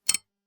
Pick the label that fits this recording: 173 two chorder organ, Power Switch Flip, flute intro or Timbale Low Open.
Power Switch Flip